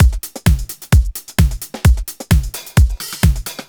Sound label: drum loop 130 boxy stripped; drums pads